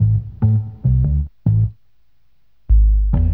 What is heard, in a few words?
bass, Loops, Phonky